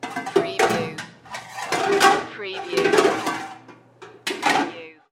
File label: Pot Noise